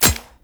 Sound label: Kinetic Plating Shield Hit; Warframe; Runner